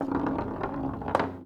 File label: Foosball Ball Roll